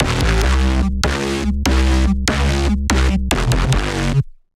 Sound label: Bass